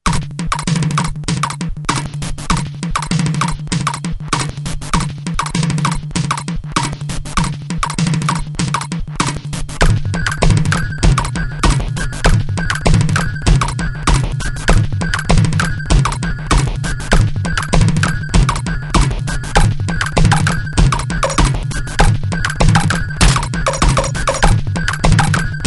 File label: ddd1 707, circuitbending; Gijs Gieskes